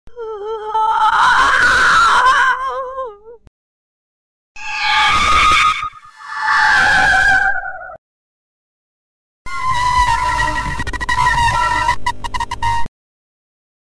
scary sounds horror sound

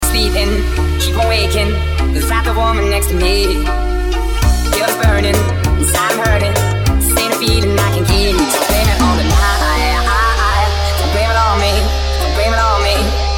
melody

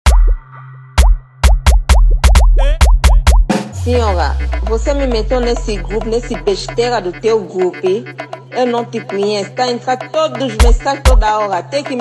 afro beat

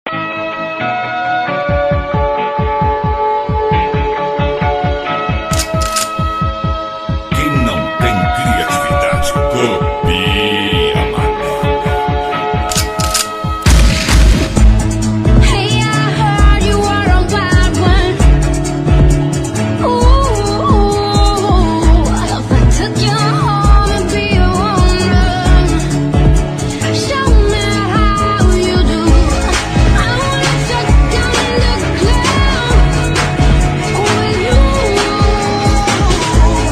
Bass Waves; mega brasilia

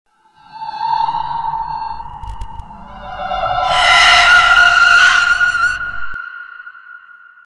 scary noises sound effect scary noise sound

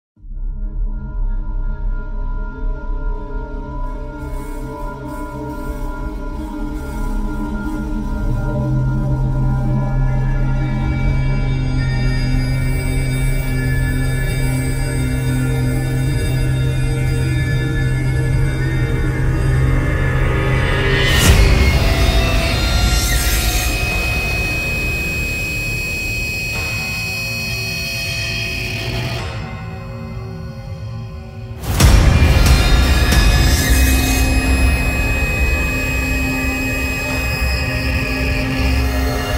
trailer music epic intense hybrid horror

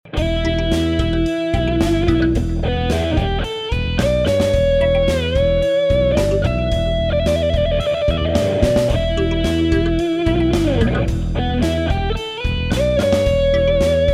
em pentatonic world

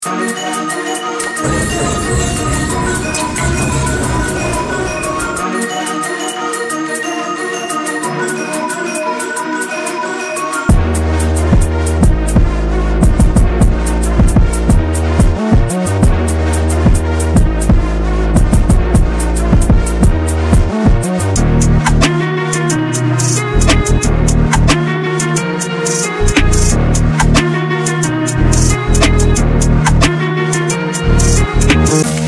drill

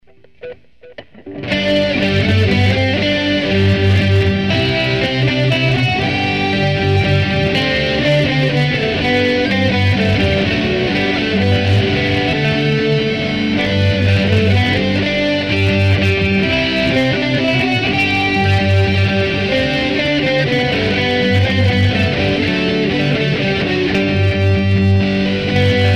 minuet in G

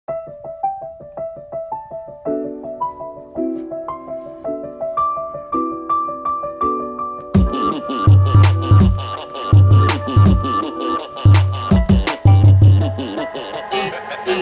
beat funk rj 165bpm

